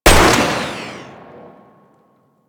Mech, Weapons; Strun Vandal

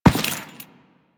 Step Default, Footsteps; Warframe; Teshin

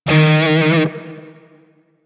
Grineer Shawzin Flourish Oct Two D Sharp; Music; DE; Warframe

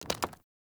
Foley Movement; Enemy; Mockeries; Mockery

